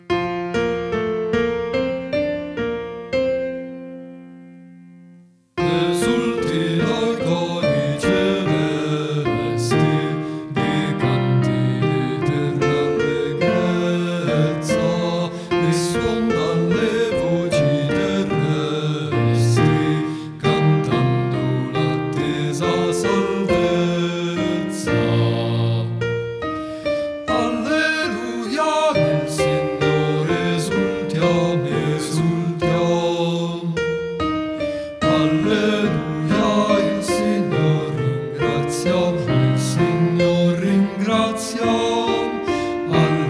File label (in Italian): BASSI